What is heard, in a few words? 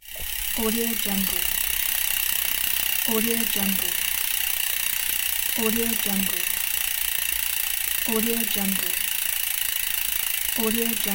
bicycle wheel spinning